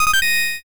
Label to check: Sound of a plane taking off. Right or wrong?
Wrong